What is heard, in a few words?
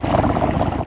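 creature lurk; SHADOWS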